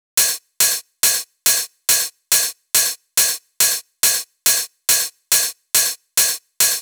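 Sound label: drum Loop 140BPM overhead; Vengeance - Essential Clubsounds Vol.5; VEC5 Drumloops; VEC5 Multi Loops 140BPM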